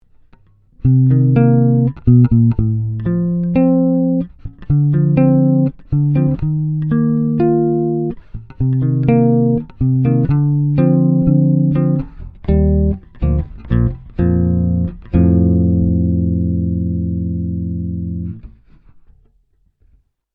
Ibanez EHB1505 Neck40 Bridge60 active low cut mid cut high boost